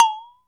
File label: Bottle B3; Planet Earth; Digital Sound Factory Planet Earth